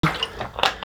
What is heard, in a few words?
Water Drink; SFX; IRL Recorded